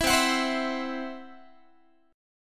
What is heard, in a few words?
C Major Flat 5th Guitar; CMb5 strummed